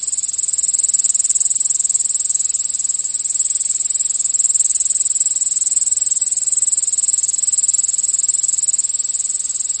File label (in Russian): cikada monotonnii zvonkii blizkii